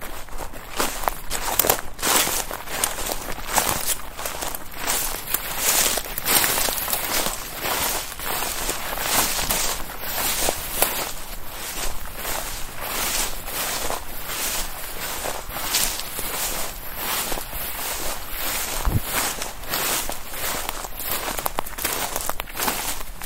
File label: Walking on wood